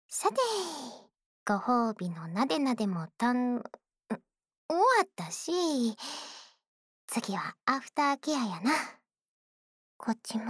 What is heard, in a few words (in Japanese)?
お耳ふーふー, 耳吹き右; こっちもお耳ふーふーしたるで～; 宅飲みASMR; 女友達の家でだらだら ～202号室関西弁の同級生～